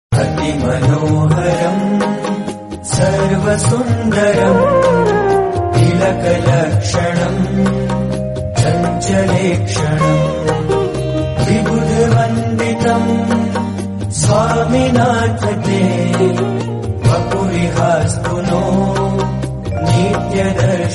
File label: devotional hindi